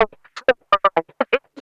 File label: voc 125, vocal-fx, vocal house; PS-28 Vocal House Samples